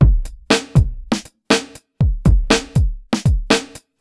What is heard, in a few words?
Bonus Beat, Drums